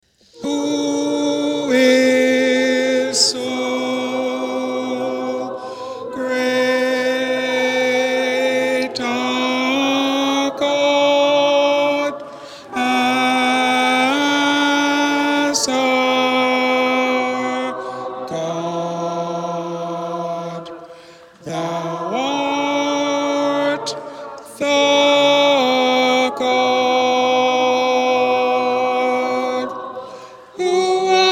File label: choir with tenor emphasis